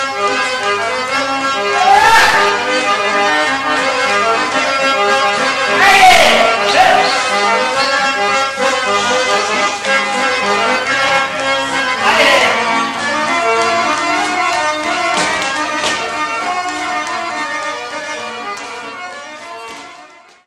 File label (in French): Kopanica - Bulgarie